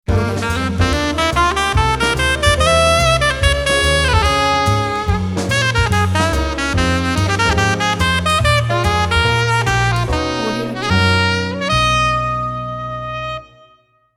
jazz alto sax logo

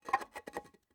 coral on granite; prosoundfx

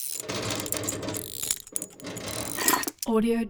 engineer fix vehicle sequence; war ui class specific; esmteam

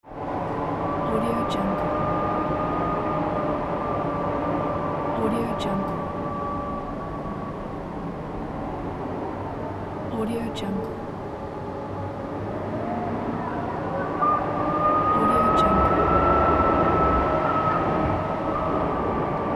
wind draft

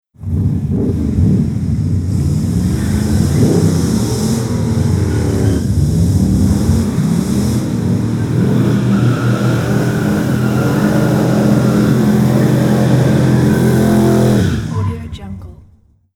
muscle car burn outs; electronplanet

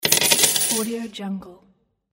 coins drop

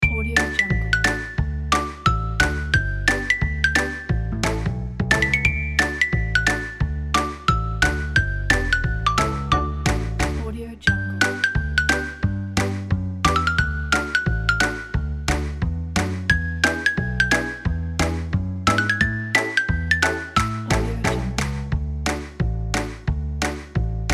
rumpus room kids

